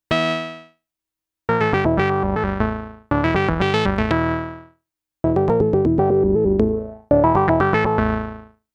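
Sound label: synth, Compact analog monophonic synthesizer; poor's man minimoog; MFB nanozwerg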